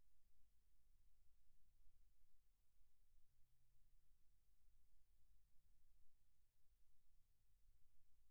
Kick In, 160 BPM

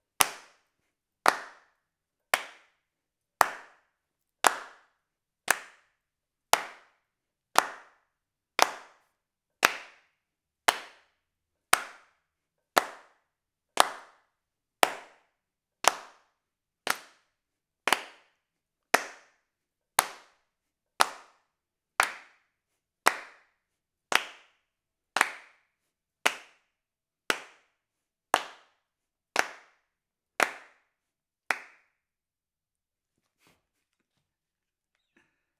Mono Room

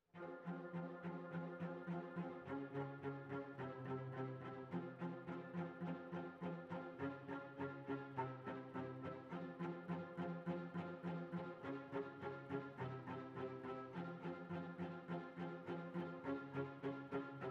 Cellos

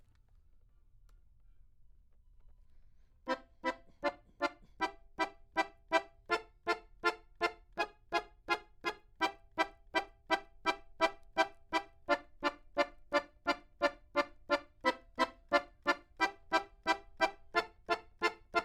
Accordion; Jazz

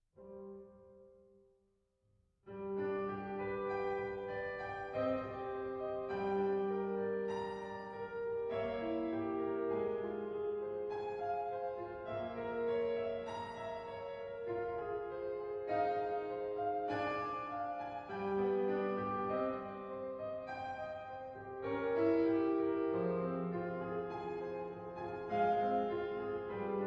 Piano 480

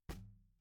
SNR Bot

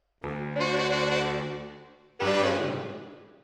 MKH AB, piano

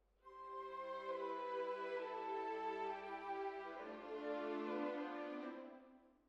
Hall, Jazz for piano

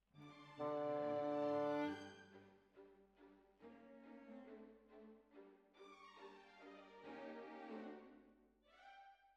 Bassoon, Jazz for piano

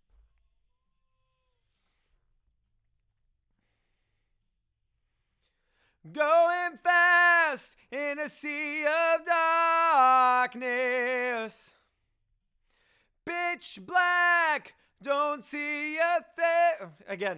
Chorus

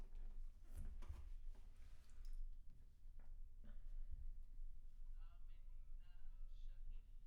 Bass Room

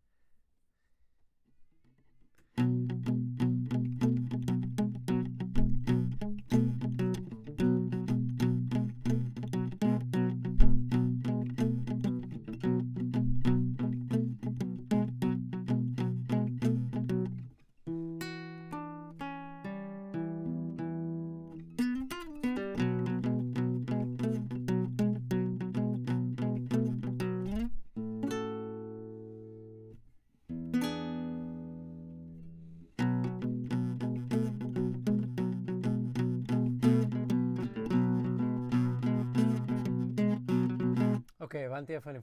Classic Guitar

